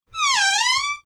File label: Creaking Door; horror sfx vol 1